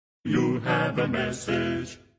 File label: Barbershop You have a Message, Ringtones; Siemens